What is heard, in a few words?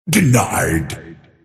deny pain; Earthshaker; arcana